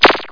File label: f laser